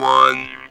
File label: Talk Box LOW R